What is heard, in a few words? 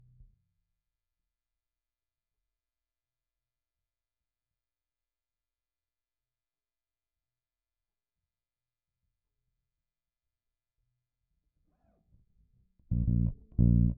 Bass DI